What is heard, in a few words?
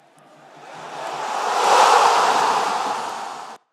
goal sound effect